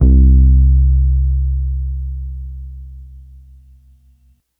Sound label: Bass Guitar C; Basses; Cymatics; Eternity Sample Pack